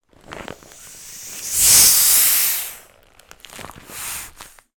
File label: toy bop bag defalting squeezing air out hard fast short release